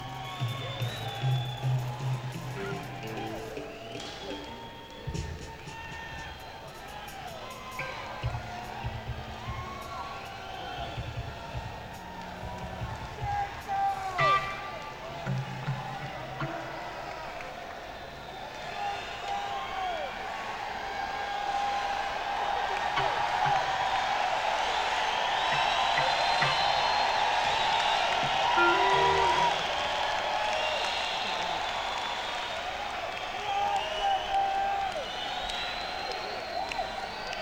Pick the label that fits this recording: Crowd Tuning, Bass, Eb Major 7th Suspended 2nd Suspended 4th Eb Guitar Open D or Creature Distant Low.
Crowd Tuning